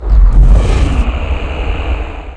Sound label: Gate Ship Out; PC Gamer IT CD 32; SWARM